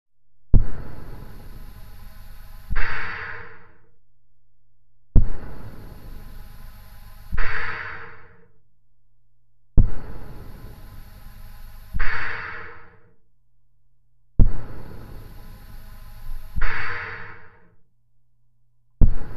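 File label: Respirator Interior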